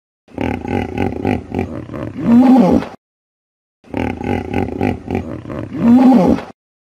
kuda nil

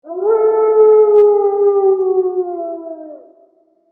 serigala

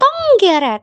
tonggeret

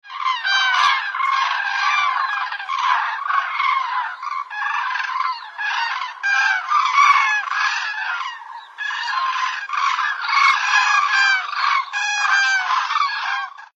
bangau

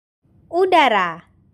udara

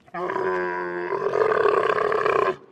Unta Suara

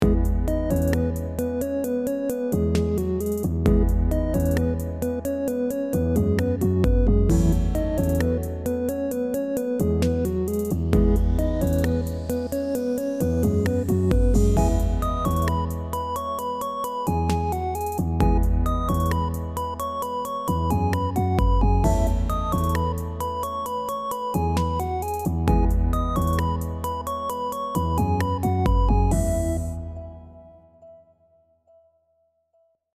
musik

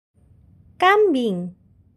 kambing

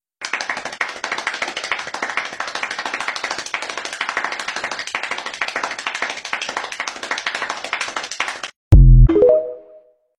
suara tepuktangan; human

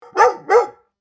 anjing

